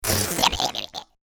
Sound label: Evil giggle